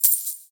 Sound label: UI Coin; Puzzle Chronicles